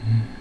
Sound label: hum him